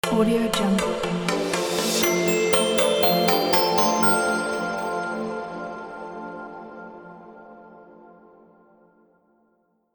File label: wonder logo